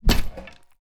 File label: spear attack